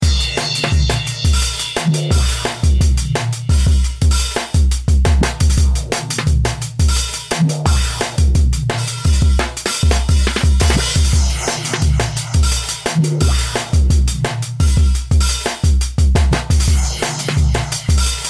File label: Cocktail Drum And Bass